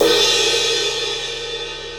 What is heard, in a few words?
18 inch medium thin crash, cymbal; aa; Sabian